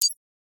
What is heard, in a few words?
cursor, button